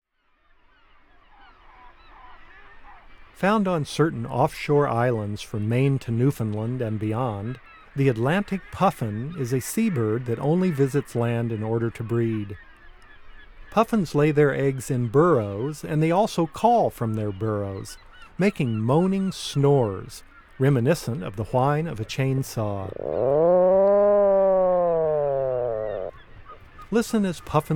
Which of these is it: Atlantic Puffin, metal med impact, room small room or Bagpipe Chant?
Atlantic Puffin